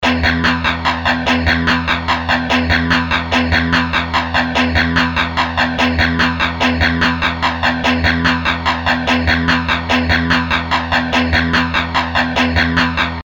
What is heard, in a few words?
Chugging, Guitar